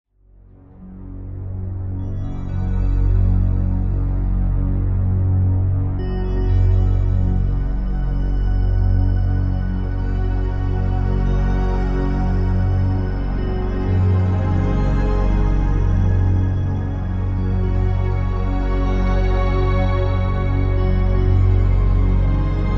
bronze pad

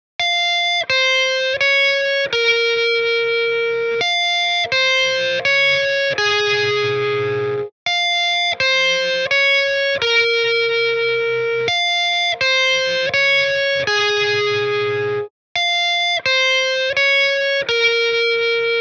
guitar off; entropy ii

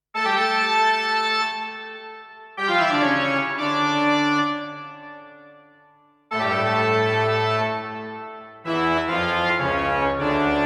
bach toccata d min; bwv 565

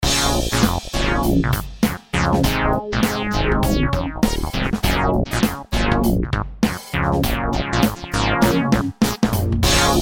roland juicy fun; bassesland

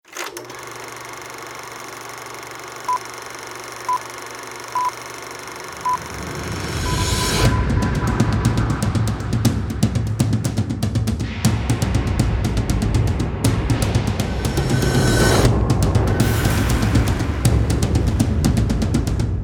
drums for the big screen

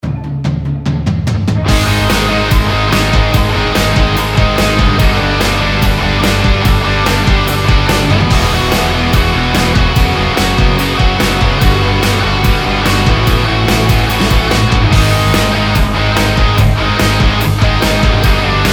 rock; rockamp

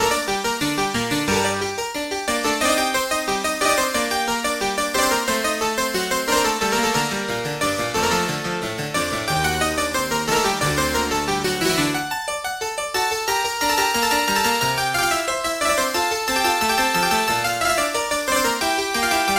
hybrid harpsichord

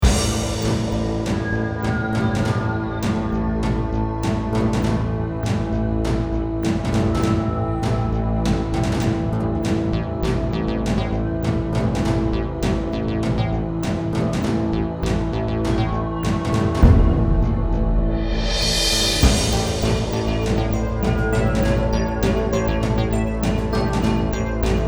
electro; re4orm

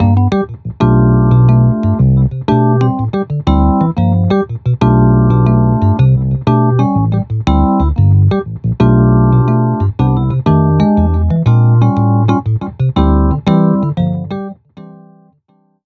electric dyno di; electricdyno mkv 2.0

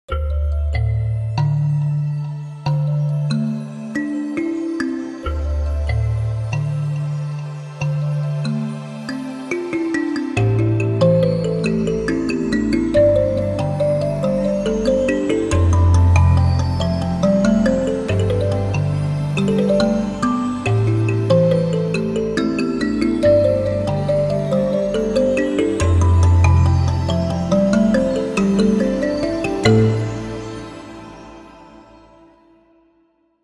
easy kalimba woodland

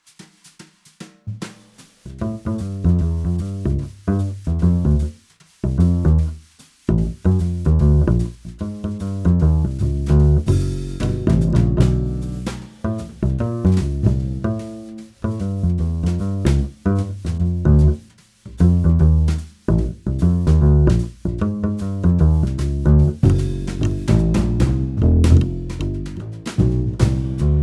direct neck

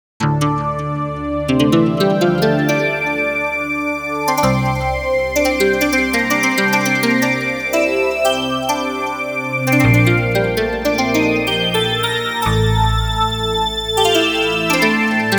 koto lead; the piper

